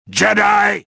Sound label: anger; reborn